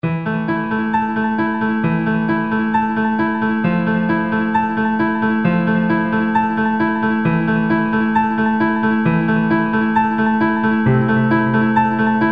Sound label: Zombie Sub Bass